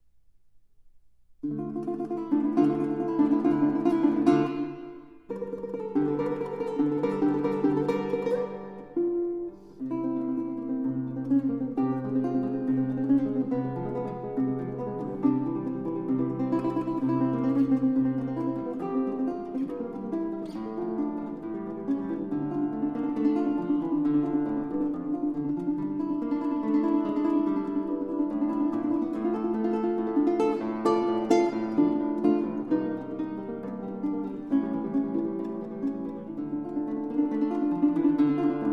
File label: Caprice; Mertz; Richard Savino